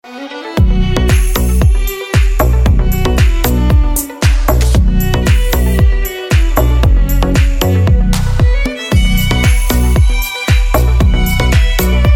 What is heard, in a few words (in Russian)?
krasivij deep house so skripkoj rington